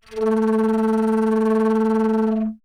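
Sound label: alto flute A4; dsp2008 sound library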